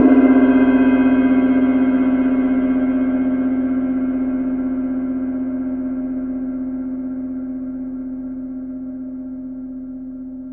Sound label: Cymbal Hit, Impact Drum Hits; Sonic Foundry (Sony Creative Software); The Electronic Music Manuscript Collection